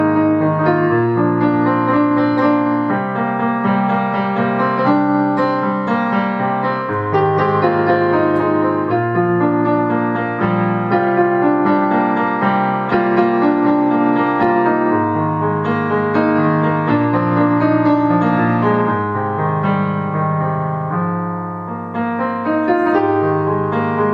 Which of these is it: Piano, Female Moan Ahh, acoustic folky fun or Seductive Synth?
Piano